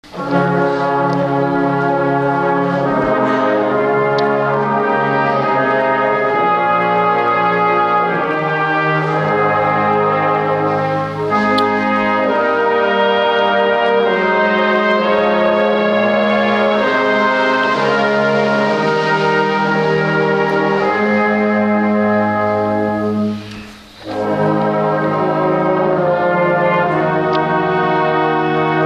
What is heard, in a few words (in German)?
choral blaskapelle